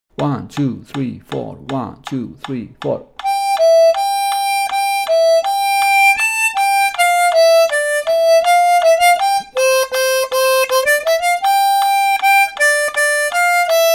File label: This Old Man 160 bpm, harmonica